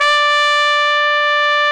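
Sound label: Solo Brass trumpet; AKG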